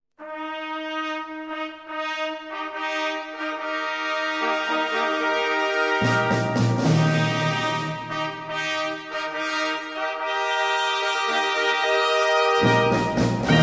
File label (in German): Jubiläums Fanfare